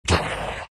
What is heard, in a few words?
big pain; Undying